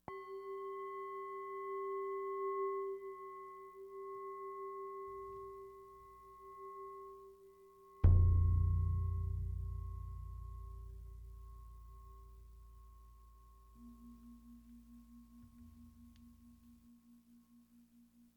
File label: Random Spacious Bells and Drum